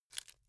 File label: bamboo small break soft